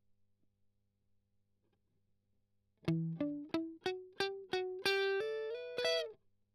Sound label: Strum Octav Arp